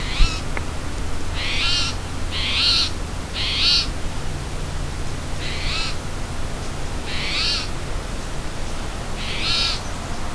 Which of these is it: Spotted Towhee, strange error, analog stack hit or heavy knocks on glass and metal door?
Spotted Towhee